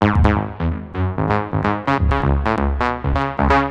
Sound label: kaos brass Ab 129, synth loop; Zero-G; Soundclash; Nu Dance Electro